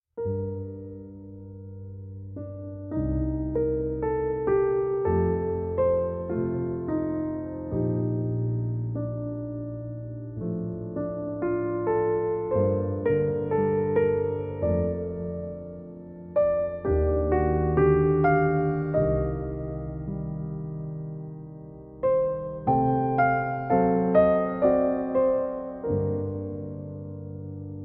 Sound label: Arr. For Piano; Chris Snelling